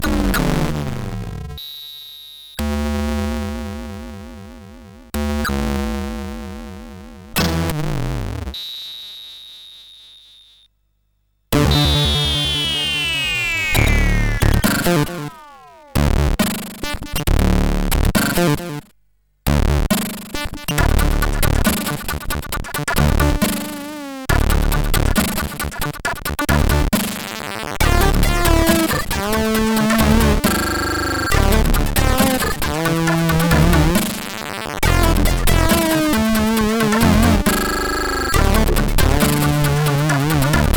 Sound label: sid, CSG8580R5; Zeropage Gravity